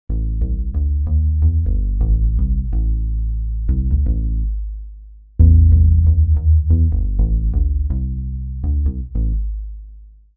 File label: Bass guitar